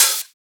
Open Hat; FLPRO

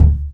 Kick

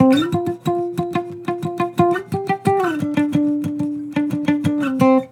Acoustic Melody Loop - 180 BPM D Min; Cymatics

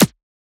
Percussion; FLPRO

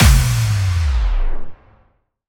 Kick Stop

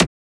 Transient; FLPRO

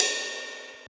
Ride; FLPRO

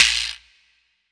Perc, Boom Bap Drum

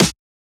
Snare; FLPRO; Snares

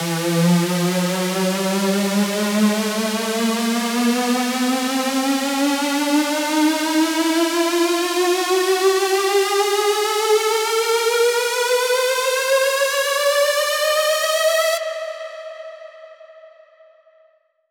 Square E 8 Bars

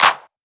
Shifted Clap; FLPRO

